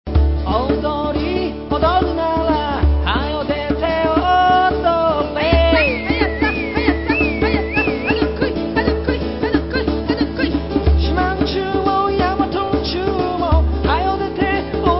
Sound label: rock